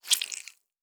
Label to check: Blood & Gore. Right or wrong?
Right